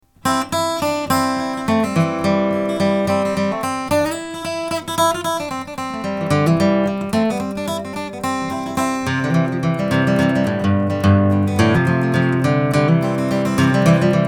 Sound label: flatpick